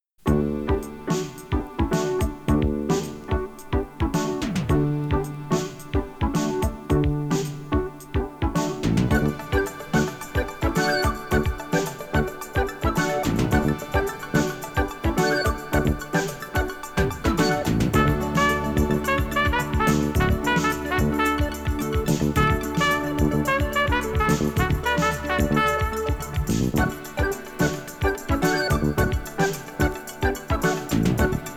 funky

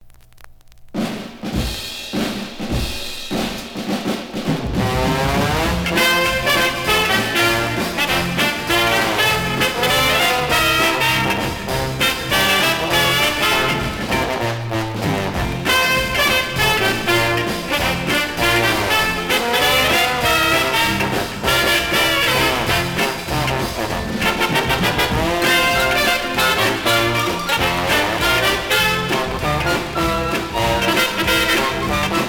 swing band; Recorded in RCA Victor's Studio A, New York City.

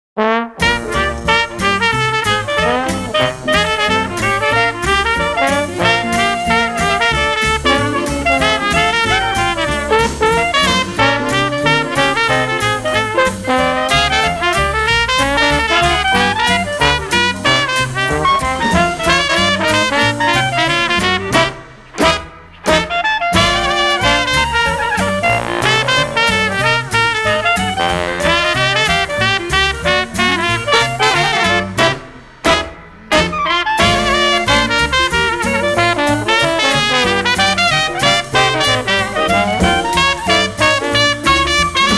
jazz band

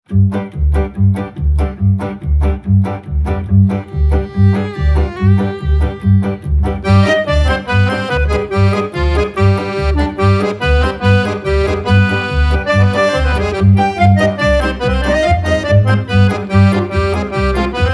freilachs

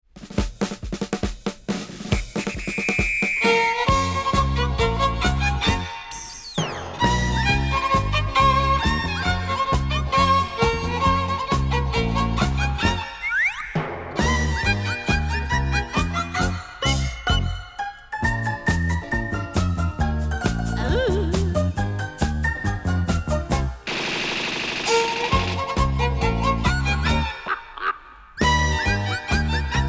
violin cartoons

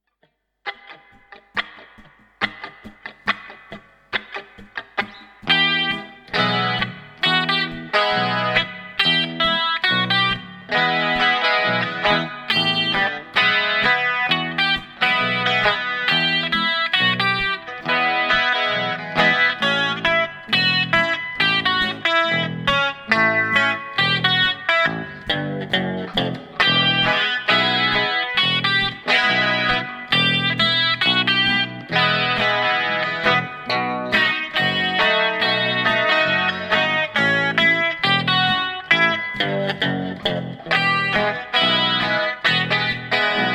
instrumental

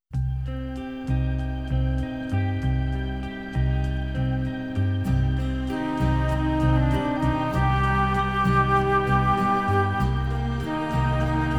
strings orchestra

